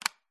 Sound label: household plug socket 4 way extension extract electric